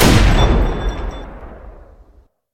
cannon; mcheli

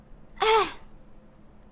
Hurt, human girl heroic; customnpcs

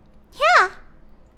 Attack, human girl villager; customnpcs

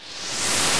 charge, magic; customnpcs

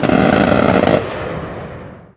gau-8; mcheli

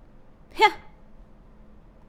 Attack, human female villager; customnpcs

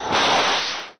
missile; mcheli

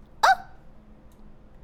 Hurt, human girl villager; customnpcs

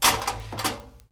wrench; mcheli

